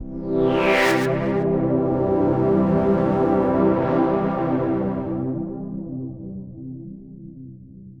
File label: Scary, electro dub